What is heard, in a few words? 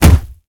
punch heavy huge distorted; Gamemaster Audio; Punch Sound Pack